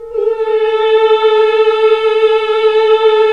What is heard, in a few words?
Nuns Mmmhh; Sound & Vision - Gigapack II